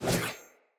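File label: Sword Whoosh left to right; Weapons; Player; Swords; Fey Sword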